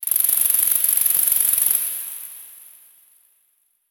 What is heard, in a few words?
environment forest cicada chirp